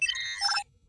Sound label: voice input, computer; federation